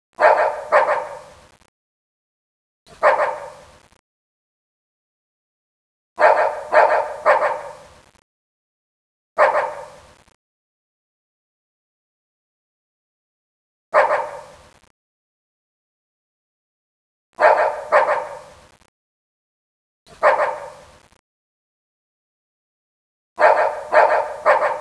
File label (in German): hund; nijmegen